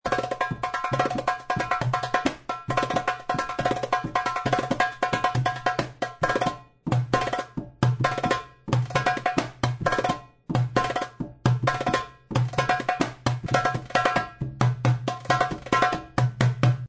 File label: tabla